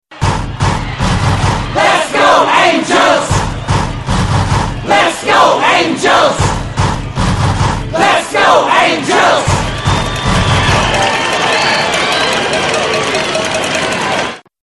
Choir; Baseball

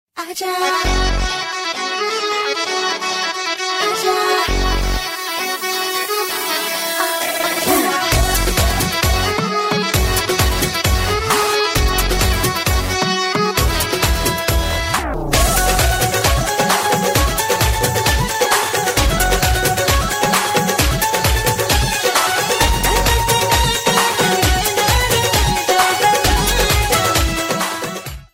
Instrumental

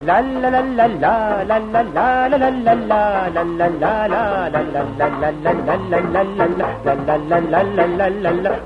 60s Lalalalala

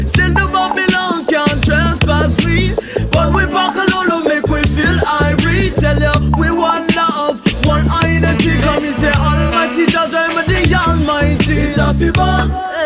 Hawaiian

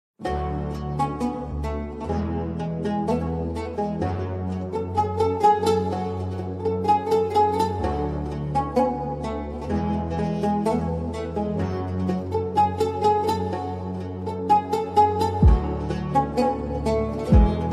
Oud

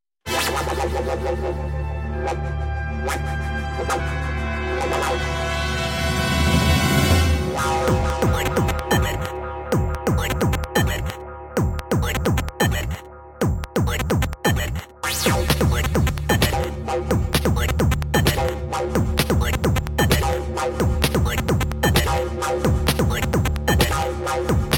Didgerhythm

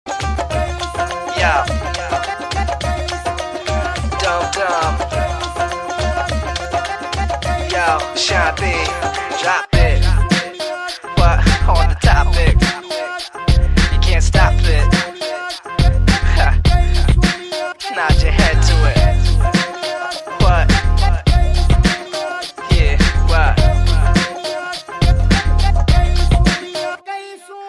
Punjabi And Rap Mix

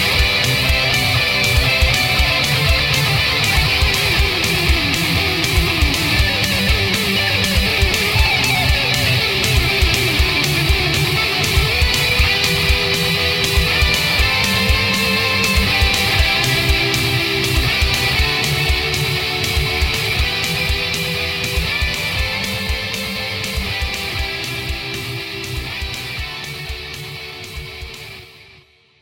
Guitar

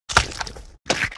Blood Drop splat

